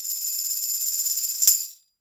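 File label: Tambourine Shake; Roland SPD-S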